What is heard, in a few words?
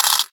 Coin Count